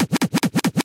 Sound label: Vinyl FX and Scratches, FX Scratch